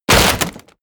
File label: break wood small